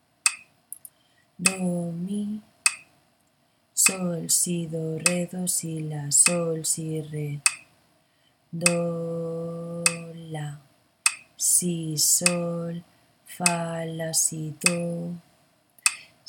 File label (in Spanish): ritmo 6-4